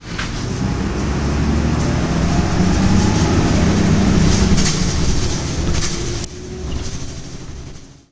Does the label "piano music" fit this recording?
No